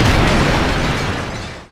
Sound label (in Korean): [Hit] 폭; 音MAD; 少女终末旅行; 소녀 종말 여행 음원팩